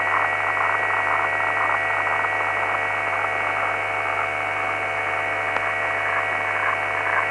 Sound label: HF Fax